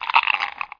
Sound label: snail slime; Frogger 1997